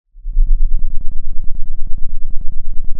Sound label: pop, Sound of a thunderstorm